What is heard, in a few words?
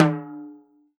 timbal lo; E-MU Producer Series Vol. 7 – Old World Instruments